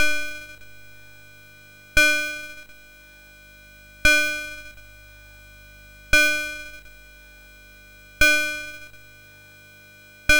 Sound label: BASIC, MOS6581R2; Commodore; C Prog Ref Guide example 10